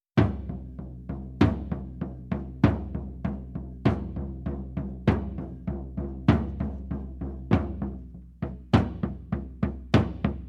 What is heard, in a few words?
red indian drums